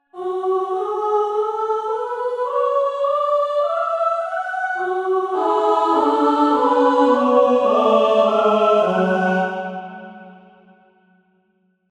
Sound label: 17 prog choir e, 17-ET